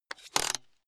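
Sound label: ratchet rattle low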